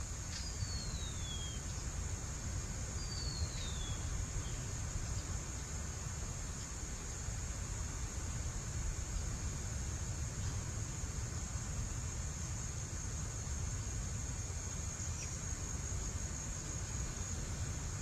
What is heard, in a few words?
Campylorhynchus zonatus Band-backed Wren, Veracruz; Ratona de Dorso Franjeado; Troglodyte zoné